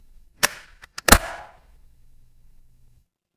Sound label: office stapler